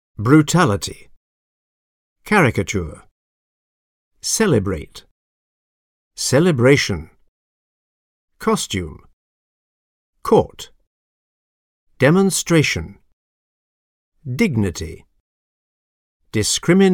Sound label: techno